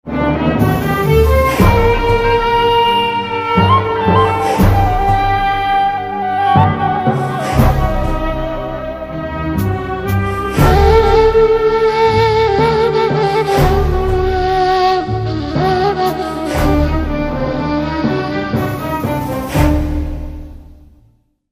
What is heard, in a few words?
ringtone